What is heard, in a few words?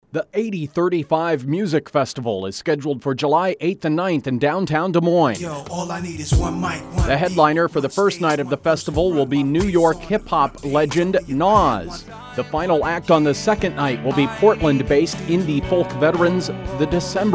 Music